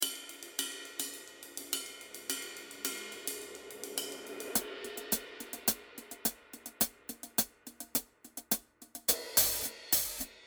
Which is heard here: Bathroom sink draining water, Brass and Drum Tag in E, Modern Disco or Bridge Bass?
Modern Disco